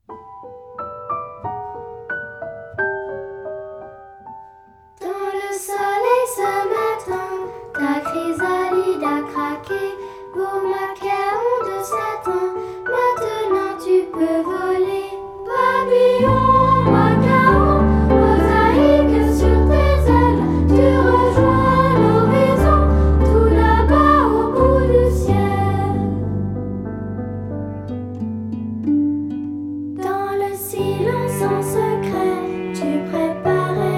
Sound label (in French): Version vocale; Papillon machaon